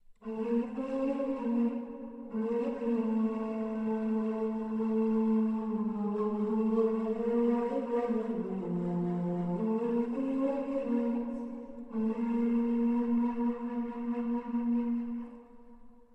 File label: ney